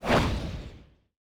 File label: wyvern dive down